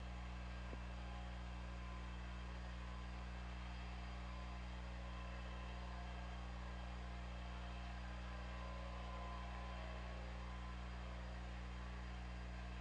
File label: Orca; OrcaLab